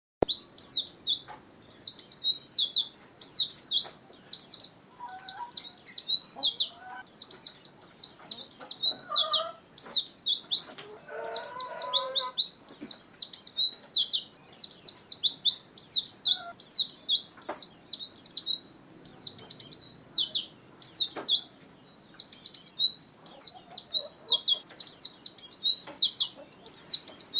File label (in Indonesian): pleci ngalas